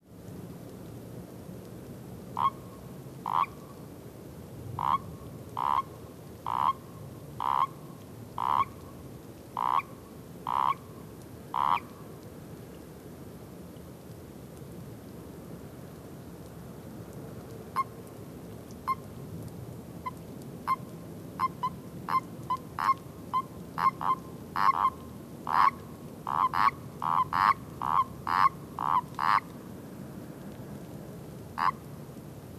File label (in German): Erdkroete Paarungsruf